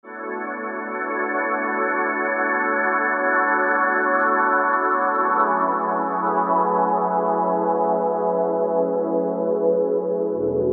SYNTH TEXTURES - Shimmer; Sanur Shimmer; Apple GarageBand 2

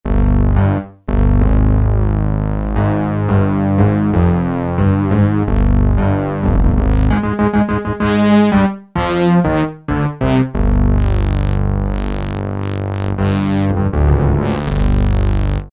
Bass, E-mu Morpheus; MostBass

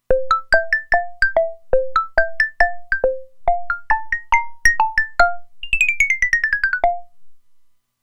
Xylophone, Roland Alpha Juno-2; Original Sound Chart patches

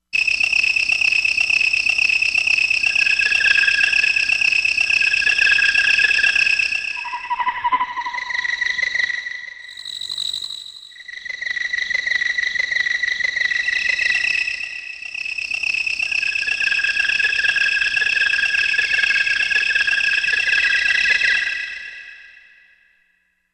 Autumn C6, Korg MSC-16S Environment

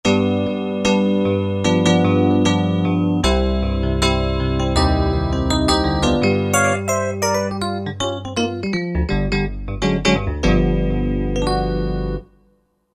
E.Piano, Roland JD-990; Factory Patches

